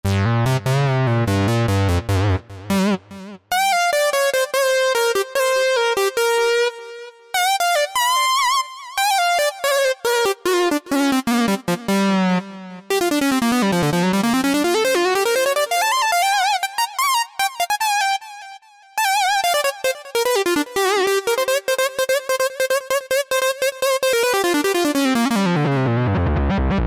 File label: SYNTH LEADS; Apple GarageBand 2; Arena Run